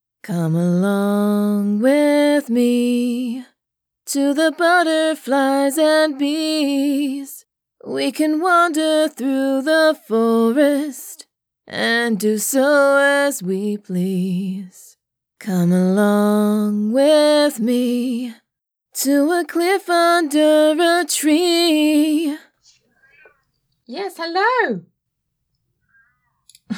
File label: Singing attempt; I actually got to voice Melony for a YouTube animation